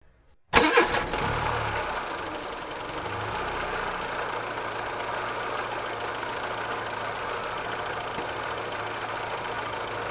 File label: Car Ignition